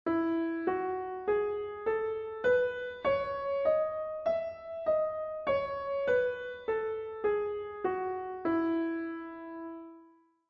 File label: E Scale Piano; California Music Conservatory